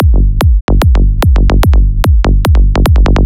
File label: KICK BASS LOOP 147BPM G; SYNDRM; Patterns PM G mix